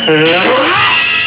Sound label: EEAAHH